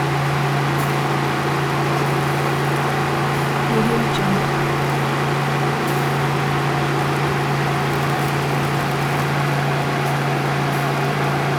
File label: industrial kitchen machine; domestic sounds